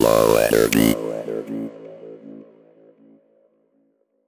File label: low energy